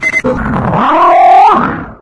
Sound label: flank announce; hunter